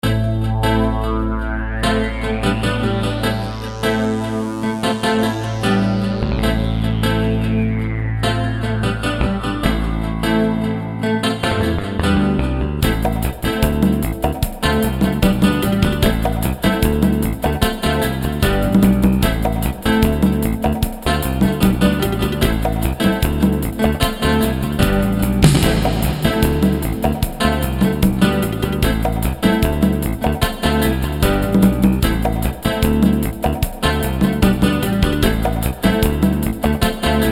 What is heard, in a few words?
reggae